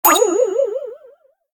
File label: toonup; toontown classic